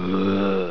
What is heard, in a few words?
zombie attack; wesnoth